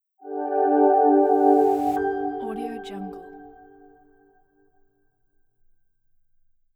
smooth soft atmospheric logo reveal; mylesproductions

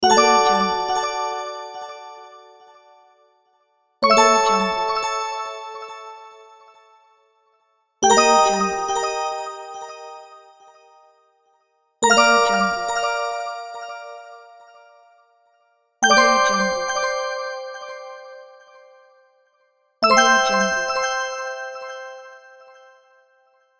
reward

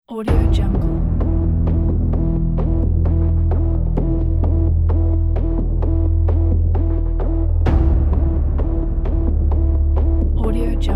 deadly tension urgent medical crime

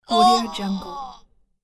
cartoon pain yell

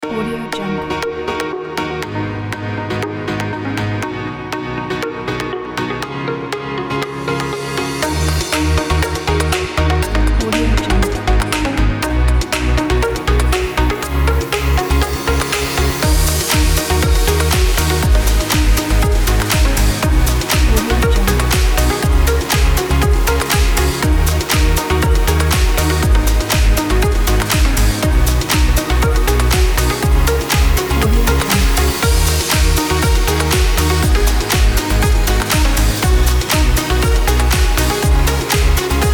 party dance

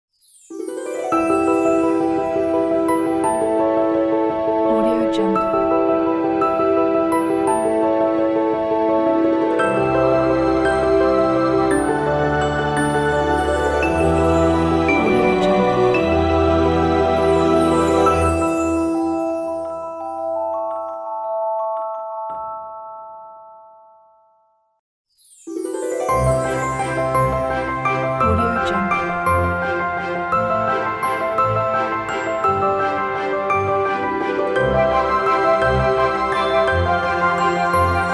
magical waltz ident pack

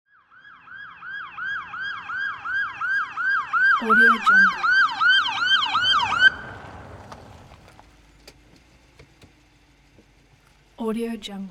police car with siren pulling up

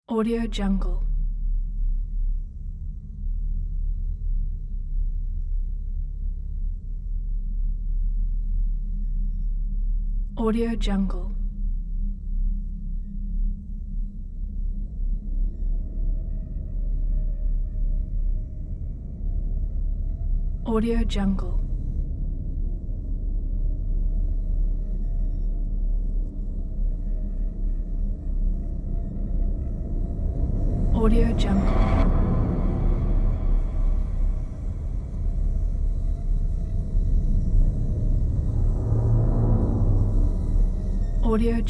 horror dark ambient

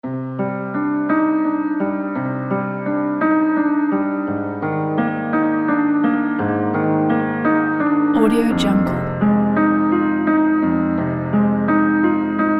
sad piano

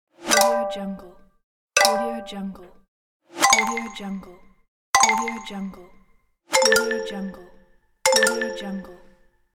phone message

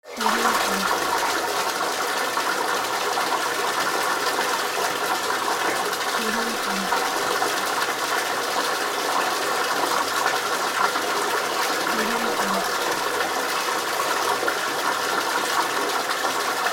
hot tub bubbling

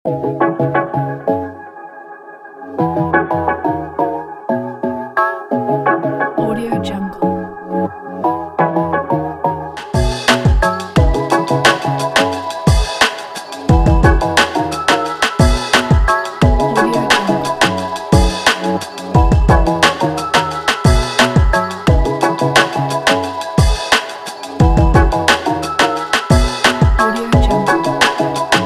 for hip hop

